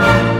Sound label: HIT, synths; Rubber Chicken Software Sample Bank